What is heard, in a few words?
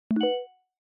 Smartphone UI Sound Dry